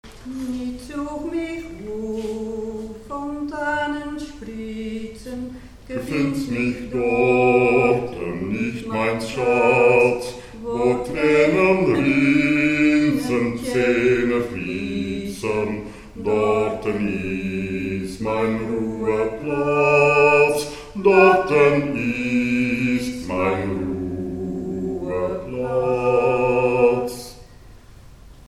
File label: majn rueplats bas; Jiddish ensemble TOCH